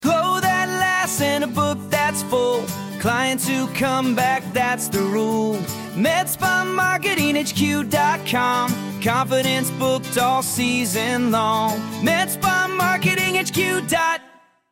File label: jingle